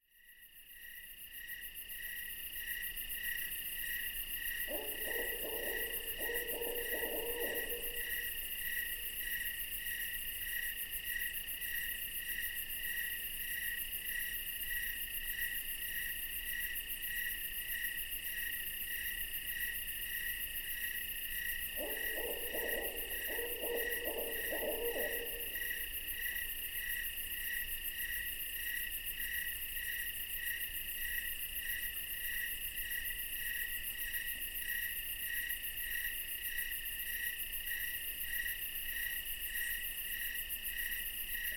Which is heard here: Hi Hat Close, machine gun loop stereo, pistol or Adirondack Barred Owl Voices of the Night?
Adirondack Barred Owl Voices of the Night